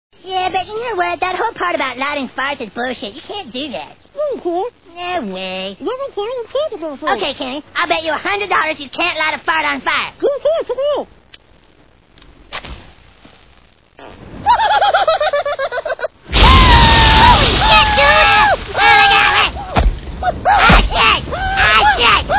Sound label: fart fire; southpark